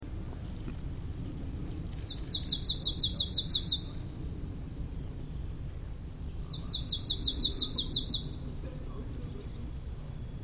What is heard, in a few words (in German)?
sumpfmeise